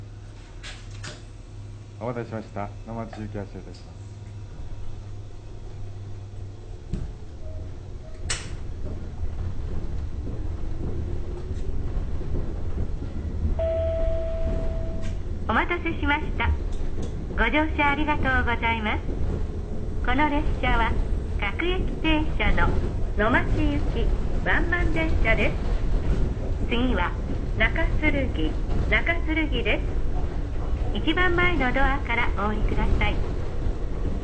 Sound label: train; hokutetsu ichinomiyab